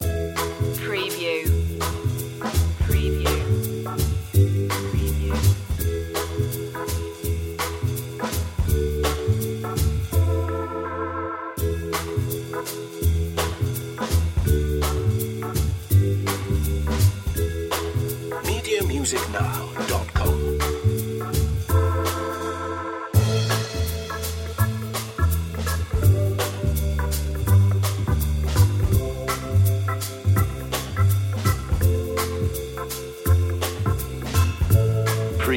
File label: retro showroom loop